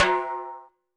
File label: TIMBALE; Greytsounds; Scott Peers Sound Engineering